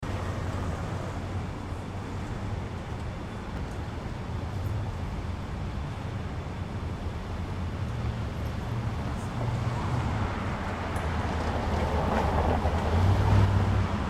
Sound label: atmo arizona prescott town square traffic